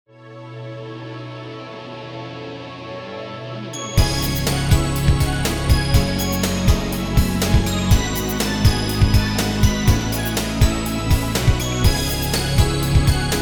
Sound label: boost bd